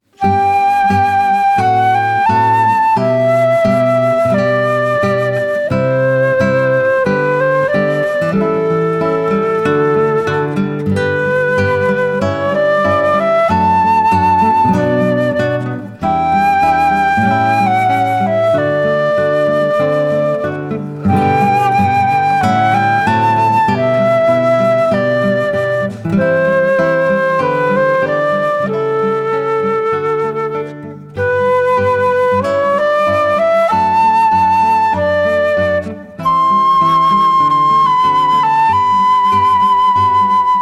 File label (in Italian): Marcia; Pomp and circumstance di Elgar eseguita dal duo Fiato alle Corde